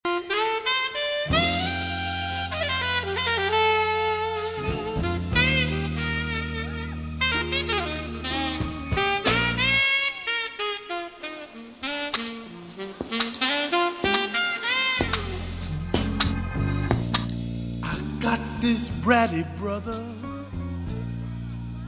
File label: jazz